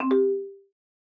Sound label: Correct